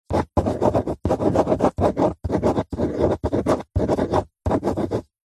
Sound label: office handwriting pen on paper on cardboard box large letters medium; zapsplat